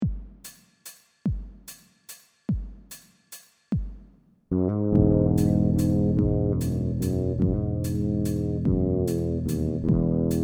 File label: FL Bass; HandMade016